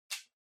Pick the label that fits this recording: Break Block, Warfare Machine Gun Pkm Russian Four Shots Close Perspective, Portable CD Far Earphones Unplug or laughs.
Portable CD Far Earphones Unplug